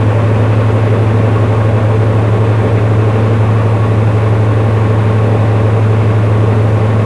idle, Train; OpenBVE; 55022 Royal Scots Grey

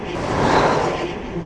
engine Stall, Train cl158; OpenBVE

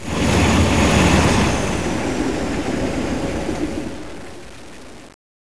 rundown, CLASS 47 Train; OpenBVE

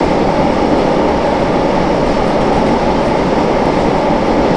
Run, Train; OpenBVE; Cl313 Silverlink

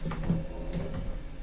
Dry wipe, Train cl158; OpenBVE

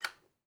Button Release, Train; OpenBVE; Cl313 Silverlink

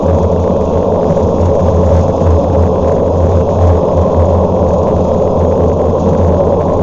motor, Train; OpenBVE; 55022 Royal Scots Grey

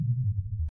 slip end, Train; OpenBVE; 55022 Royal Scots Grey

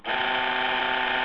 buzzer, Train; SD-9; OpenBVE; Szemely

